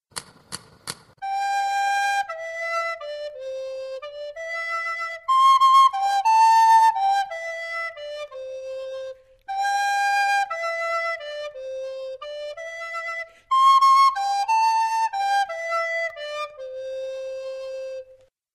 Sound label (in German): Bim bam die Glocke schwingt instrumental; Orff; Lied